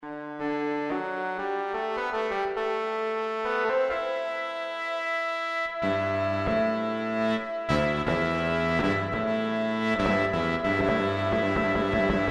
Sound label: cello